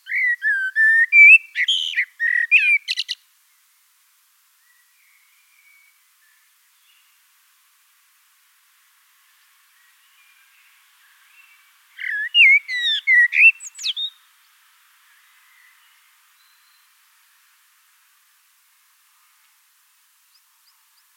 Amsel Gesang; Wiesloch

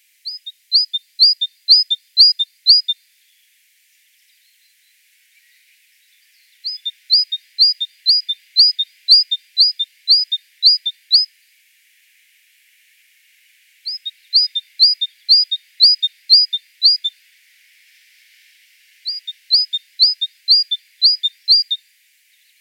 Kohlmeise Gesang; Wiesloch

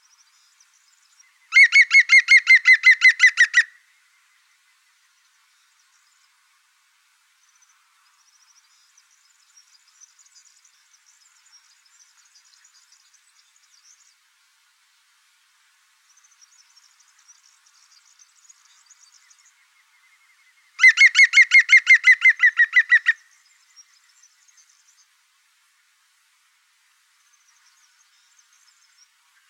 Grünspecht Gesang; Wiesloch

